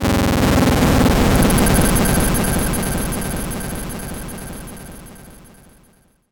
erasing magic

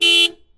honking, car

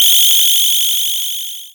beam; arms

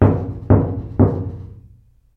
knock on washer; knocking

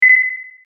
button

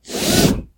seatbelt; car

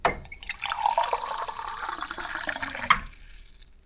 pour water cup; eating

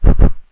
kung fu wind; attack

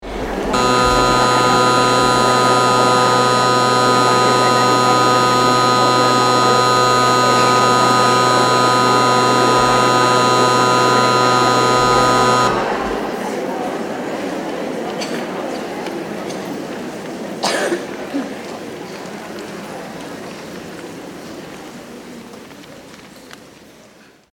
buzzer

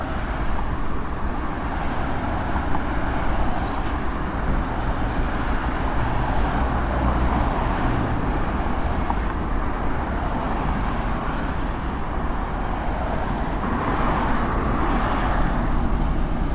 car road

